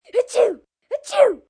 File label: Sneeze Kid Double, Sneeze, child, double, cold, sick, (human voice); Sneezing; VoiceBox